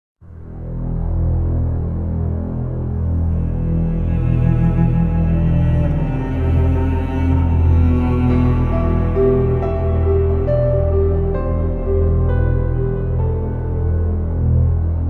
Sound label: Huddle Fail; Mass Effect 2